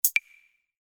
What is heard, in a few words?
UI Quirky